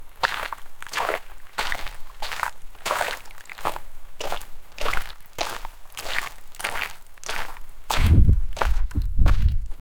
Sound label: walking in water with, wading